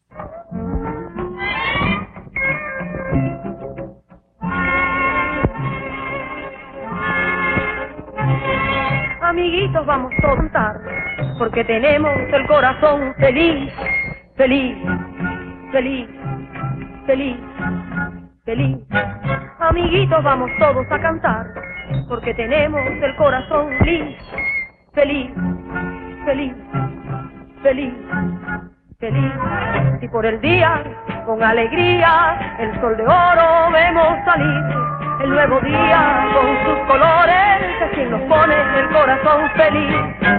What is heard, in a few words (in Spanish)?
Feliz Feliz Canción Infantil